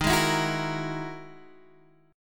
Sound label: E-Major Sharp 11th D sharp